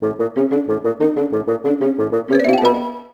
Tension

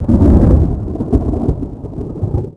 thunder; WhiteOut; XBOX; crater

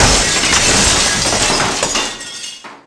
smash skylights; hits; Tony Hawk's Project 8; XBOX

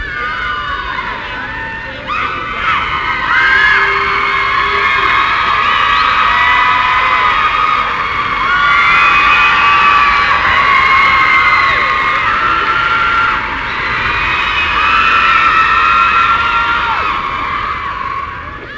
back noise; UFCTapout1; XBOX

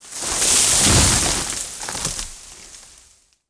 tree fall; impacts explos

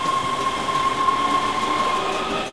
subway begin

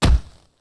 object hit; movers objects

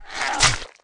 Spit, Valleakan Mutant; Valleakan Mutant 2; Aliens

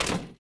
fs metal, droids robots

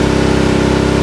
993tt rpm3, Engine; Cars; XBOX

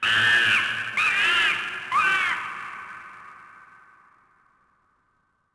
monkey; Splashdown; XBOX; Soultree; Amazon

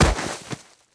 bodyfall grass, foley

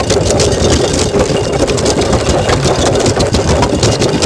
roll tile roof, terrains; Tony Hawk's Project 8; XBOX

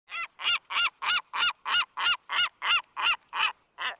Seagull; ambience; Project Gotham Racing 2

